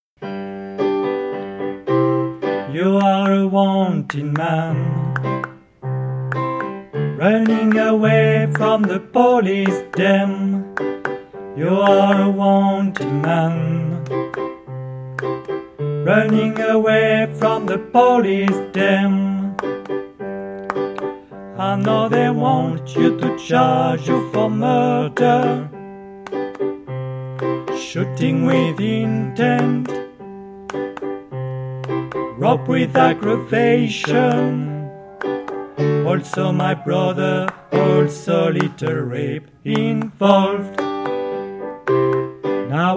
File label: Tutti